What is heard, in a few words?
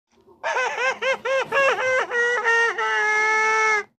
crying; swampy